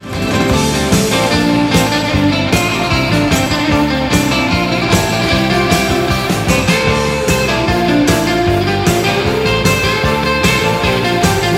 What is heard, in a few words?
Schecter Strat Red; SCHECTER STRAT RED 1980; GUITARS